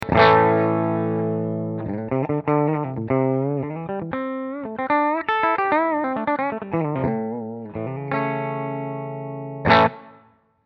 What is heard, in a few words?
Clean